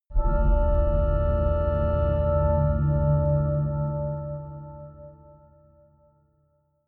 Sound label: organ swell minor short